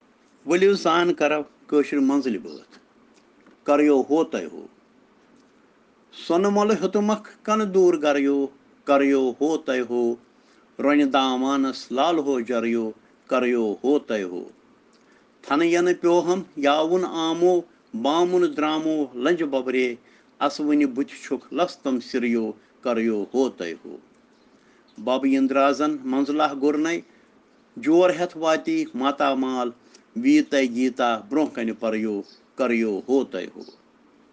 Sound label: Children Rhyms in Kashmiri